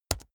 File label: keyboard laptop space press fast hard